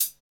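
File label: hi hat; Best Service Gigapack 2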